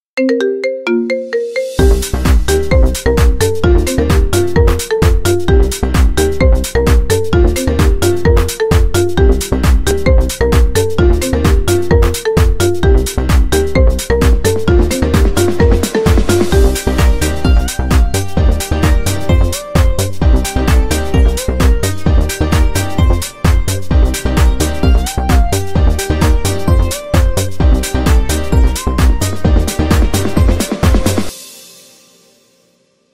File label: Marimba